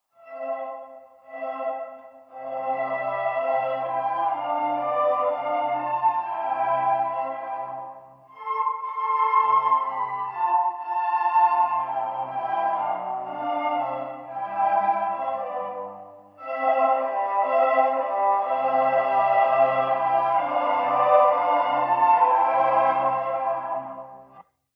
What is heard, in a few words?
music 5m conv